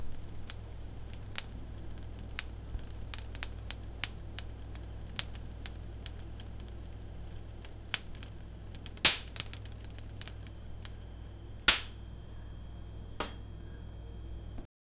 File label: an oven burning a bit